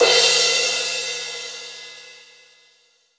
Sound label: Crash, Cymbals; Roland BK-5